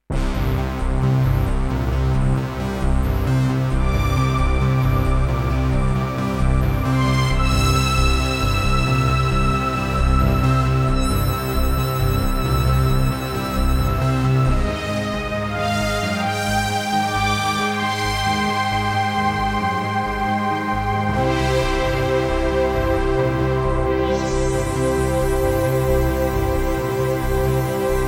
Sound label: Jupiter